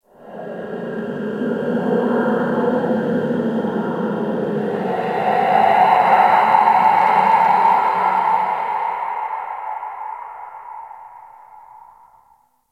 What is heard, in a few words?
Ice Cave Wind; Warframe; Corpus Ice Planet